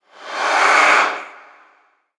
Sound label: idle, mob endermen